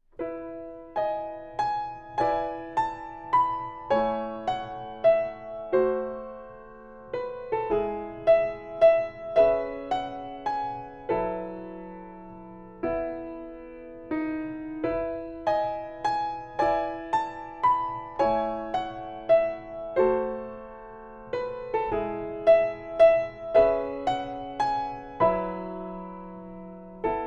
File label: Piano Solo; Sketchbook Full Colors; sound sketch book